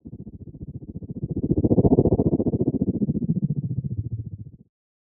helicopter pass by; soundscrate

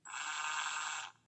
drill slow short; SoundsCrate

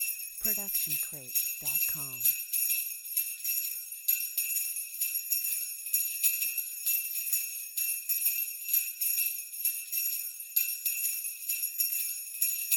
christmas sleigh bells rhythm; soundscrate

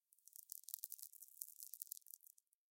TV Screen Static; SoundsCrate

